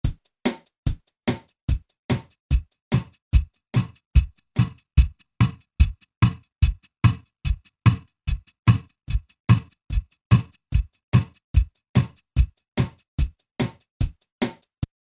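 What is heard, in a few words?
fx git to bass, guitar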